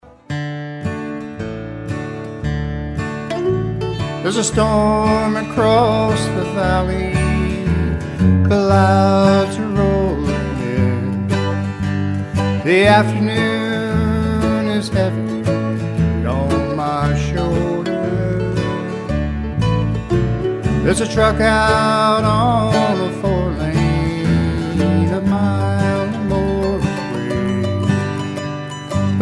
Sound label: Country Band; Hartford